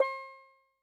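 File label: steel drum; Yamaha DTXpress-II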